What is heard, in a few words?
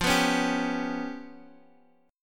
C sharp 7th Flat 5th, chord; C#7b5/F strummed